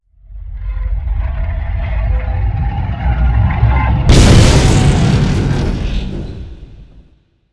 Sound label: astro implo explo metal; Earth and Beyond; EA Games